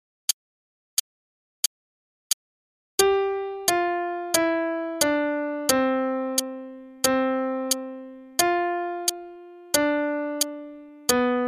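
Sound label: Starlit Melody, piano; Faber Adult All-in-One Book 1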